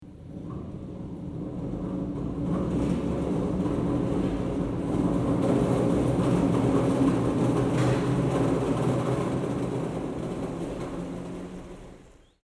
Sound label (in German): foerdermaschine; ottischacht